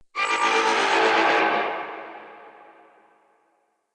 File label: Galvanic Alarm